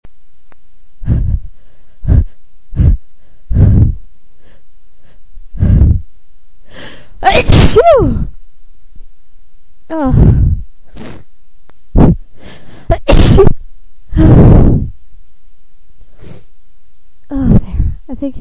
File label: dust at me, female; dust at me part 2